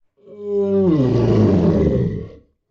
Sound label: Ice Giant Attack Vocals